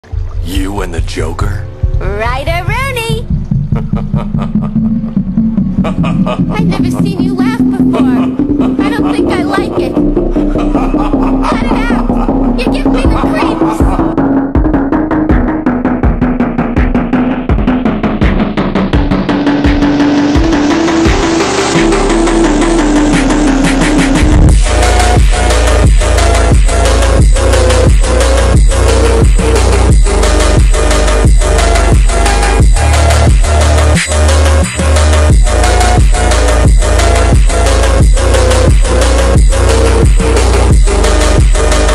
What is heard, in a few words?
phonk remix slowed